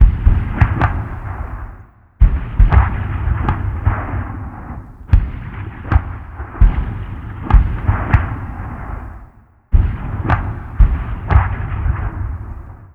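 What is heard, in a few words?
aa gun explosion low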